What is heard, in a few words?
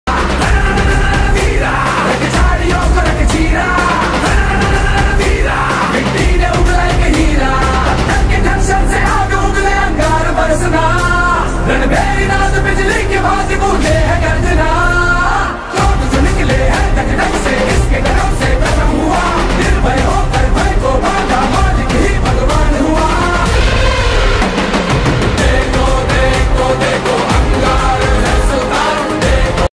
hindi ringtone